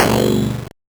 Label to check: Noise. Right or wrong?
Right